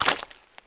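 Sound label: land flesh; ambient; Shadow Man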